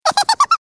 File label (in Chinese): 嘿嘿奸笑; 笑声集合